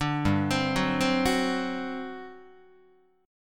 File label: G Major 7th Suspended 4th, Guitar; GM7sus4; arpeggiated